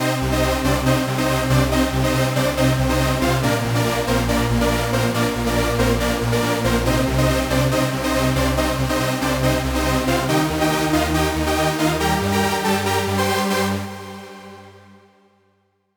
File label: Super Saw; straightliner